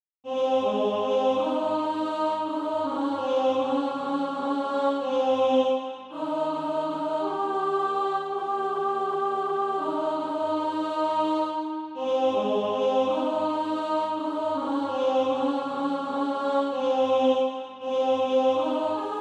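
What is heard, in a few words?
alto, Practice Music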